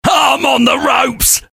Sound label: hurt vo